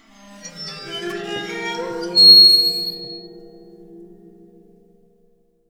waterphone

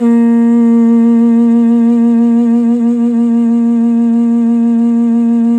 CONCH LOW, World Percussion

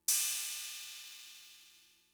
Cymbal; Fricke MFB-522; CYMBALS

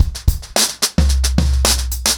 110BPM, acoustic drums, drumloops

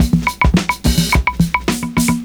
PERCS, 70 s Breakbeats

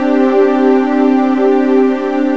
Ending pad sus2; jazz jackrabbit 2

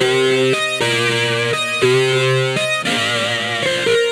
Harmonic Distortion, Keyboards

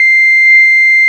PWM80; CMP - Analogon; THE WEEFS

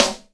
TIGHT SN

